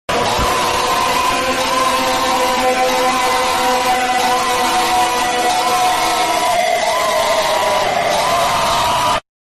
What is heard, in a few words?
jumpscare sound